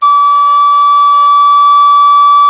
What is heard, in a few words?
C#6, reed flute; SoundBytes - Early Patches